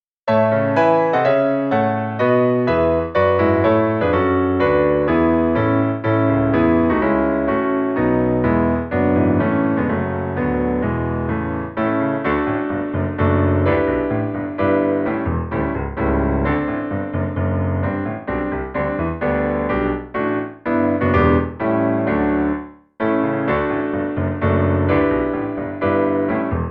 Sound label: Triton; EG Triton 94Mb; Schumann Scenes